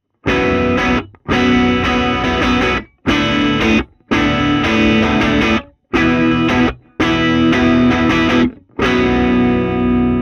Guitar Box - TLM103 Card; Neumann TLM103; cardioid (kardioida)

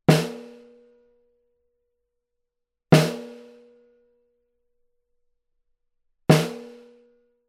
Snare - C12 Card; AKG C12 VR; cardioid (kardioida); oba mířily zepředu zešikma na horní údernou blánu.; edge duo srovnavaci test

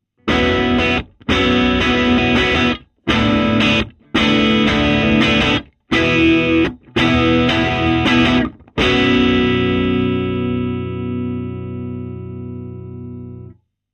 Guitar Box - C414 Card - Edge modelled; AKG C414; cardioid (kardioida); edge duo srovnavaci test

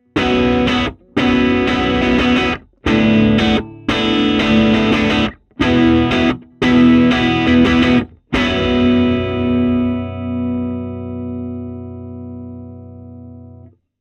Guitar Box - C12 Card - Edge modelled; kardioida

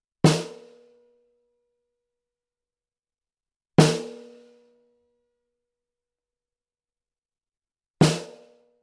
Snare - C12 BiDirect; bidirectional (osmička); edge duo srovnavaci test

